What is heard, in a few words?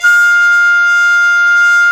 WND FLT MT, Flute & Mute